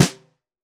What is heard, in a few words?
FX Snare